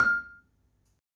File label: F4, Marimba; Terkelsen